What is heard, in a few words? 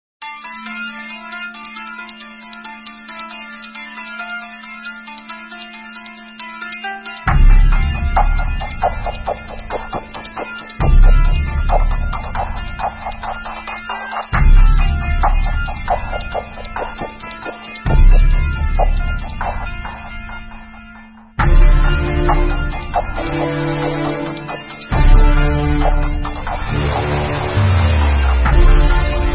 bgm; LiveOrDie